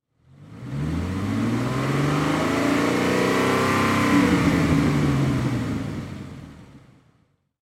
Auto Ford Gt 500 Kr Mustang Engine Rev Automobile Car; Transportation; Cars